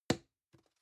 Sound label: plastic can small fall bounce soft